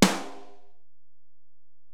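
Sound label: Acoustic Snare, Alesis DM-PRO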